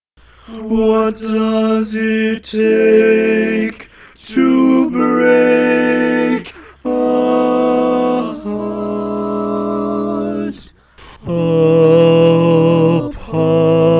Bari, barbershop

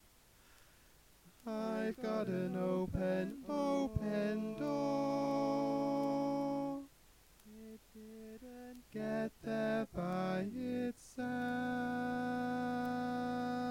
Open Door Bari, barbershop tag

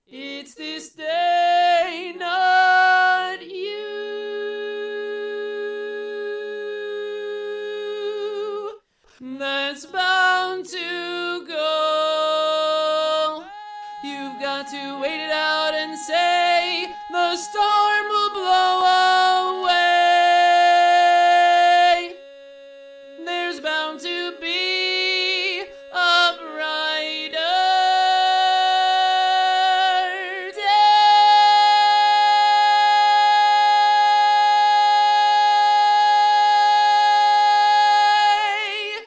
Lead, barbershop tags

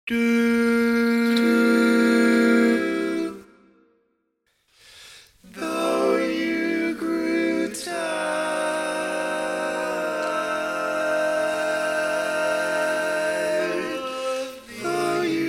Tenor, barbershop

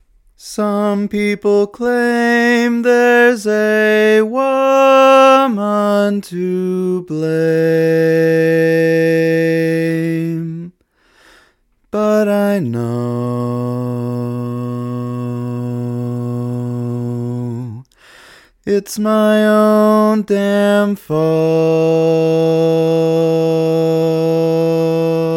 Bari, barbershop tags

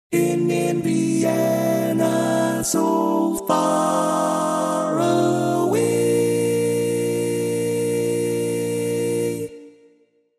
Tenor, barbershop

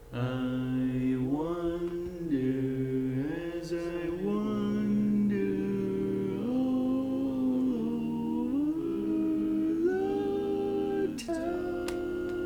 Other, barbershop tags

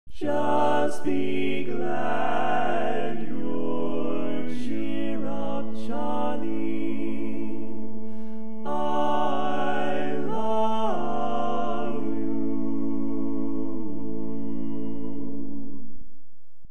Tenor, barbershop

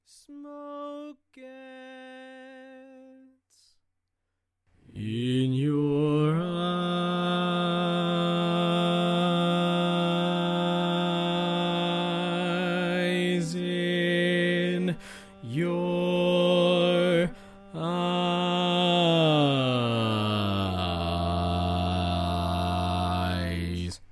Bass, barbershop tags

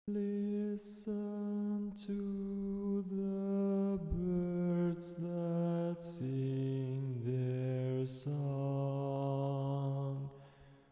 Bass

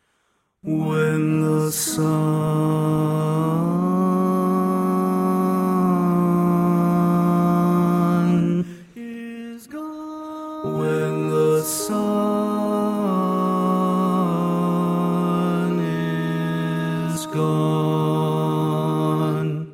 Bass

